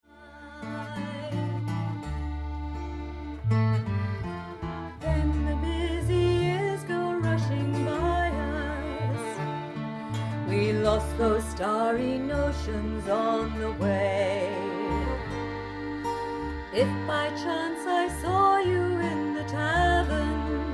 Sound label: reverb Chorus